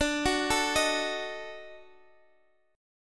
D sharp Minor Major 7th Flat 5th

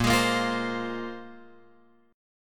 Bb 7th Suspended 2nd Bb, Guitar Open F; Bb7sus2 strummed

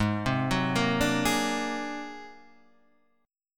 G sharp 9th Flat 5th G sharp; G#9b5 arpeggiated; JGuitar

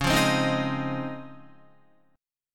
Db Major 9th, Guitar; strummed; JGuitar

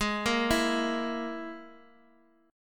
G sharp Suspended 2nd Sharp 5th, Guitar, DADGAD; x,x,x,1,1,2

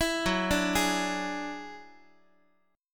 F sharp 7th Suspended 2nd Sharp 5th, Guitar; x,x,7,4,6,7; 4A,4E,5A,5D,5Gsharp,6Csharp; arpeggiated

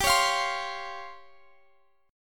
Db Major 7th Flat 5th, G, guitar

